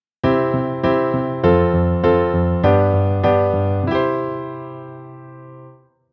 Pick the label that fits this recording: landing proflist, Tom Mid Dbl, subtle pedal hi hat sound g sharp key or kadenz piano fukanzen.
kadenz piano fukanzen